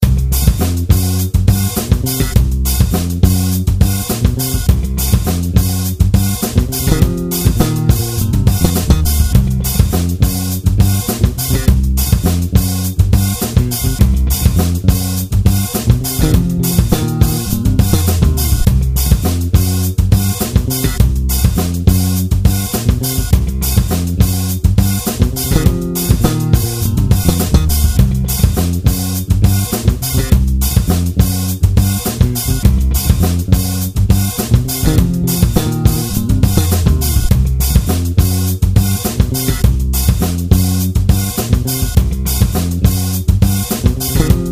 fender squier vintage modified jazz bass test recording; paul leishman